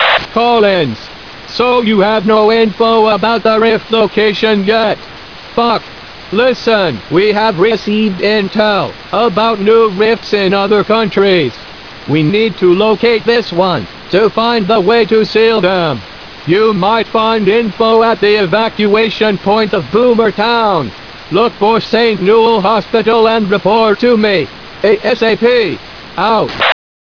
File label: church; markson